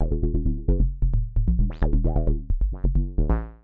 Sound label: acid twiddle; SYNTH AND ELECTRONIC LOOPS